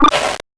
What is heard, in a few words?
Sound Reward Box Text Update